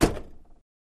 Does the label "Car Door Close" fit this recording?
Yes